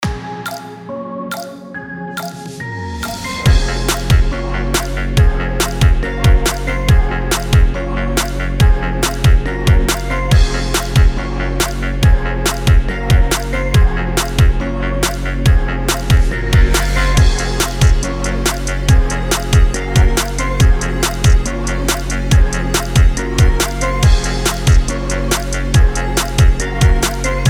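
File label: HALLOWEEN F minor 140BPM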